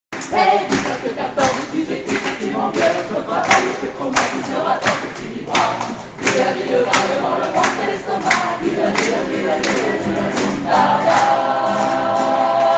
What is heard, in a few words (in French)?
chorale